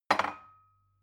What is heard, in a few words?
glass put down cupboard wobbly